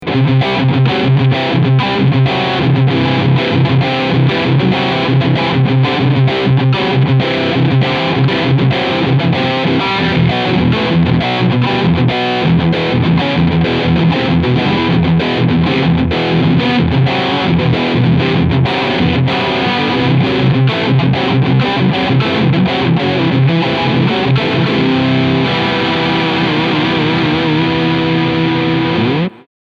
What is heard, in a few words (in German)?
Traveler Guitar TR VAIBRANT Overdrive Riff Steg Pickup